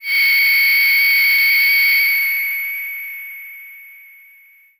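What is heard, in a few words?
Breath V DX100 C#7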